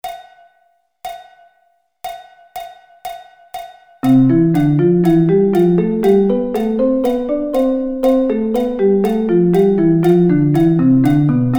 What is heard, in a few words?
C Thirds